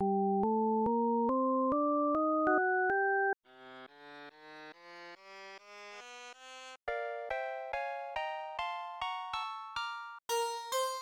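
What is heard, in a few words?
Harmonic minor scale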